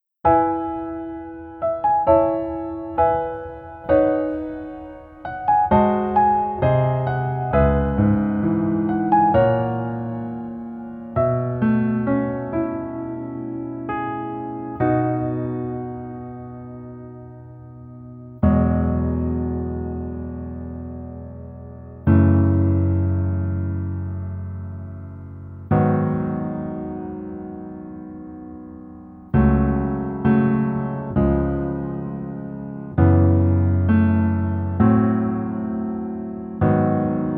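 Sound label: Piano version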